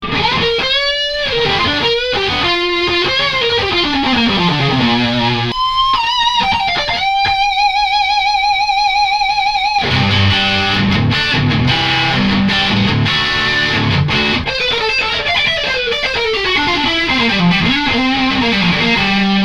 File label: Lead, pedals